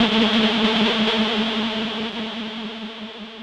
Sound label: TECHNO SYNTH 140; Keys